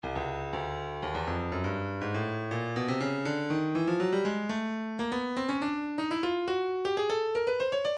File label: stylus breakbeat midi files 121 bpm; Studio 54 B